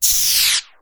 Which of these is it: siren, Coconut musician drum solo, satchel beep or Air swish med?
Air swish med